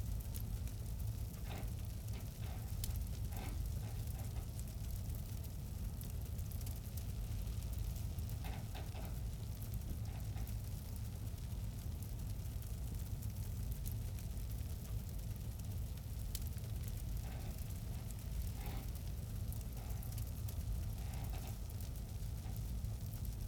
atmos

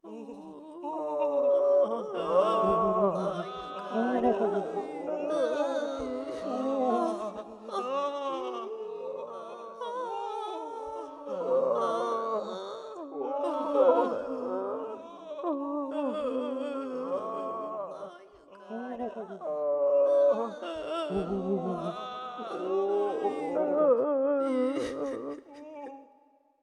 invasion crowd loop; bonetown

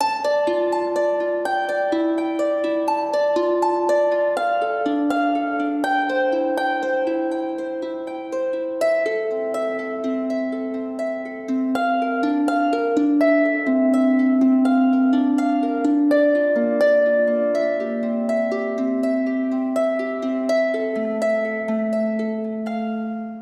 needolin general loud; Hollow Knight: Silksong; hornet

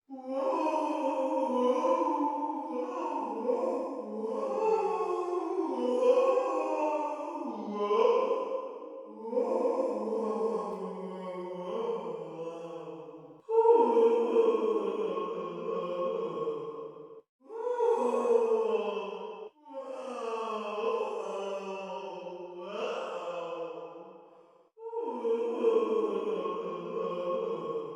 wails loop; Hollow Knight: Silksong

